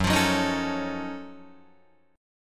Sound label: F sharp Minor Major 13th, Guitar DADGAD